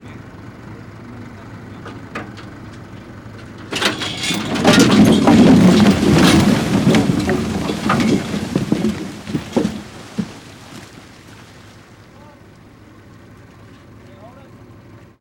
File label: industrial logging truck idling and dumps logs into river; sound effects one